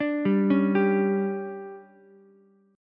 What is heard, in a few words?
D Major 7th Suspended 4th, Ukulele